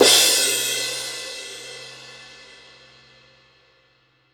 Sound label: cymbal crash; Voice Crystal - Steve Reid Definitive Percussion